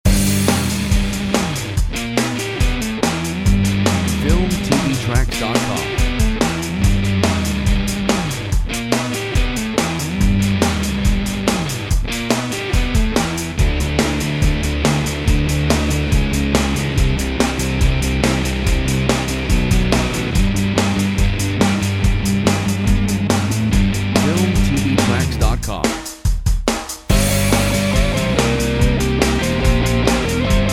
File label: Dusty Blues